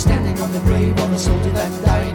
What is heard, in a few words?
music; wu xing; earth